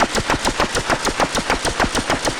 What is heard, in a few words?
Rhythm Noise, 100bpm; rhythmic inspiration